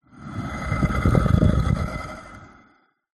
garden bird bath stone bath turn on base heavy scrape; household